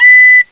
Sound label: KATO; CMI